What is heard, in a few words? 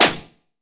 SYN FX, PERCUSSION; AKAI S6000 CD-ROM - Volume 5